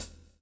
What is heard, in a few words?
SWNG